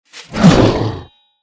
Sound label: warrior attack; Scorched Canyon